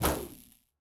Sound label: throw ball shock; CrossCode; battle